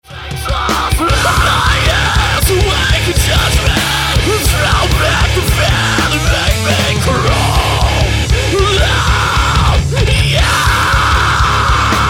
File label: scream in context